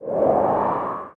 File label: air buff end